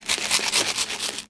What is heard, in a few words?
Foley